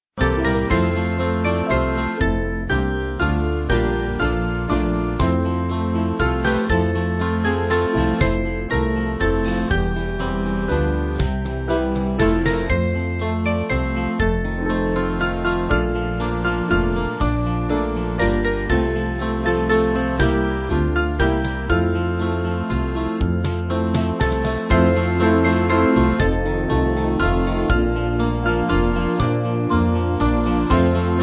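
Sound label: Band, Music Backing Files for Churches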